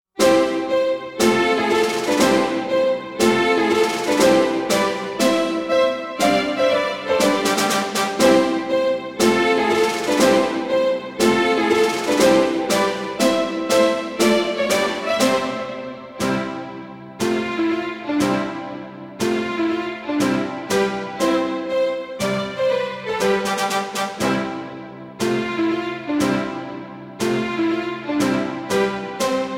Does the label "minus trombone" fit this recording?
Yes